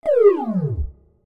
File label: retro laser; sci-fi sfx